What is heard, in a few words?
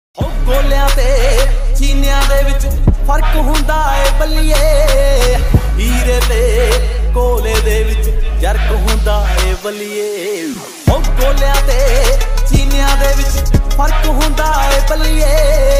Desi Punjabi Ringtone